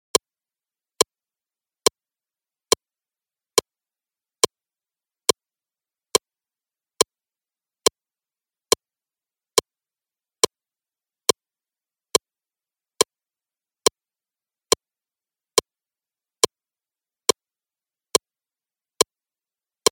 Basic Blues Riff, 기타; 백킹트랙 ON

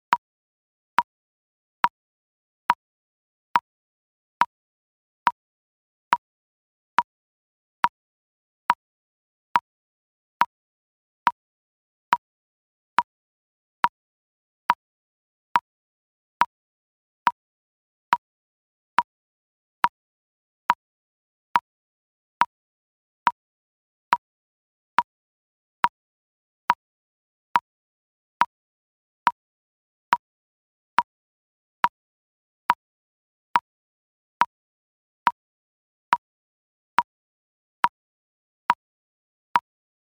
New Chord, Rhythm, & Lick; 백킹트랙 ON

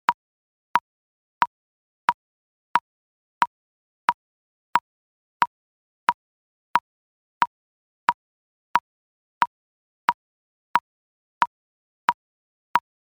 Boom Chick Basics, 기타; 백킹트랙 ON

